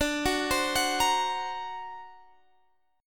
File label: D Augmented 7th D down Guitar Standard; D+7 arpeggiated; JGuitar